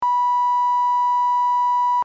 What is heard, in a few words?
sine wave